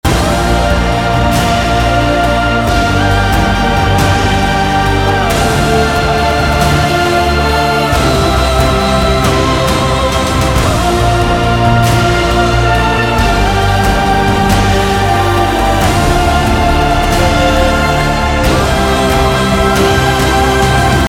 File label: Loop Action